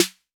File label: 808 & 909 Kit CLASSIC SNARE; Roland Juno-D